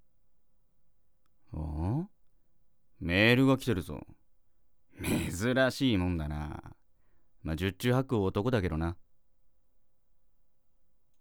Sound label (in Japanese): メール音